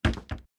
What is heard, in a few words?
Scarf Close; MiSide